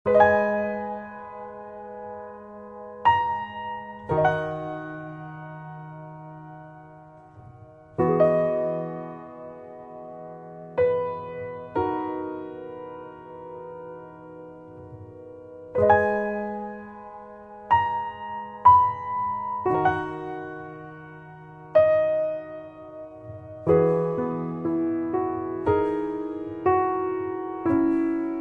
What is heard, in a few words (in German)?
Fluegel Ballad